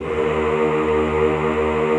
EHH D#1, Classical Choir; Best Service

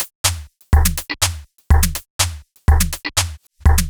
Top 123bpm, House Drumloops

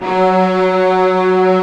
STR SEC F#2, Strings; STR SECT #1

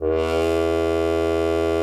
CUP MUTE; Xsample VOL-3

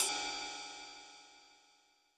Roland U220 Electric Set, Drum Machines

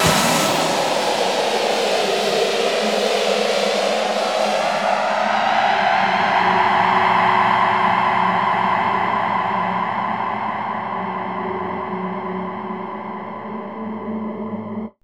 BANSHEE, AMBIENT; AMG - Vince Clarke Lucky Bastard

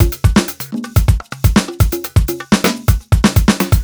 125 la fine beat, Nu Jazz House; 125 Beats

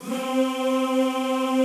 C3 R, Classical Choir; Best Service; Extended Classical Choir

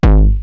Roland TR-909 BD; BD Selection

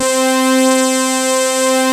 SYNTHS; Pro-Rec - Synthsonic

